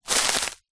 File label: footstep single grass thick dry; foley; zapsplat